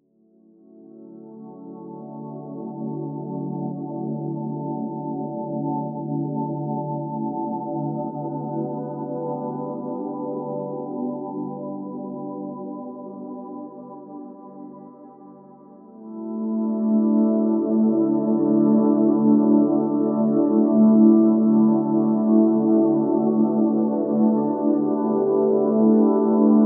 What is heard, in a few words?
Binaural Beats, Alpha Waves